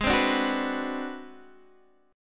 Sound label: G sharp 7th Sharp 9th Flat 5th G sharp down Guitar Open D, chord